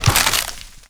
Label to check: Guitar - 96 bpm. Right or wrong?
Wrong